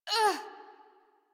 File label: Generic Short Pain Operator Voice; Warframe; Operator Mission